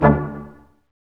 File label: BRS Quintet stac; Roland L-CD702